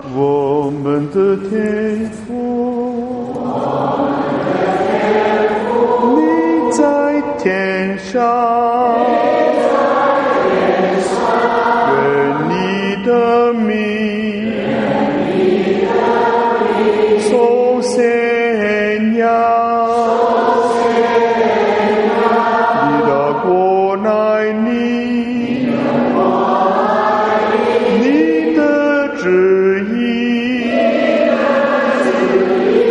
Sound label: Our Father sung in Mandarin